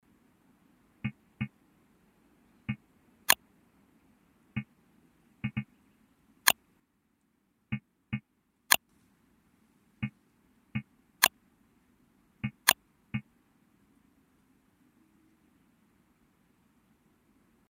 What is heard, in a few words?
button sounds; don't starve